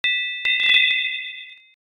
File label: shrine bell